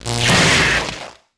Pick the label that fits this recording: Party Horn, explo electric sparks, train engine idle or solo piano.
explo electric sparks